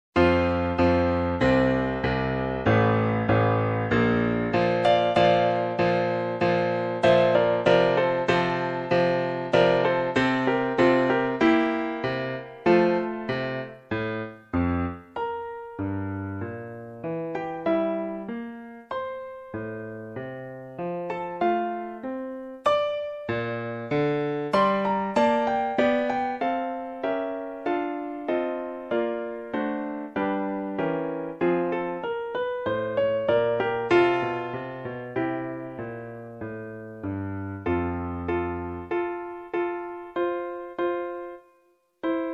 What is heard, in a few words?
ob konzert, oboe; オーボエ用伴奏; mozart